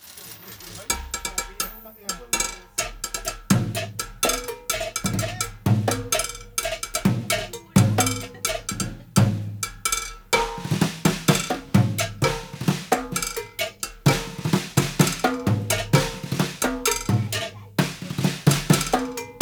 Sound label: Cowbell Bird Drums Blues